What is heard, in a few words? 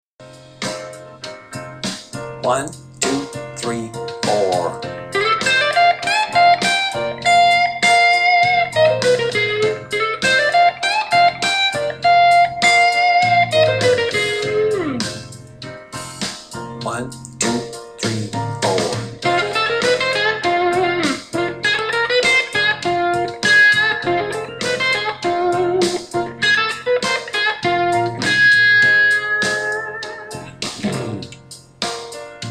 licks played in the key of f